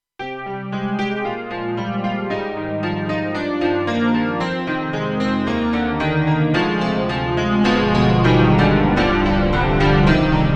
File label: Korg X3R Piano; PianoHaven